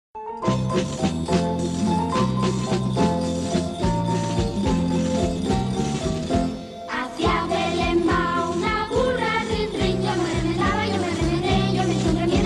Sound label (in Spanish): Coro Infantil de Villancicos Populares, villancico popular; Navidad